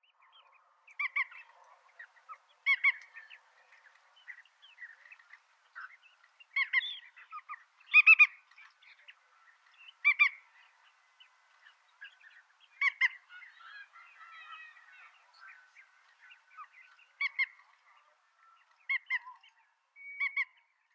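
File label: Pied Avocet; sanbanse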